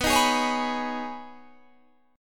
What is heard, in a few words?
B 6th Add 9th B, chord